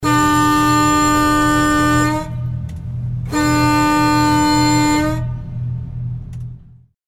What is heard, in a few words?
fire fighting boat horn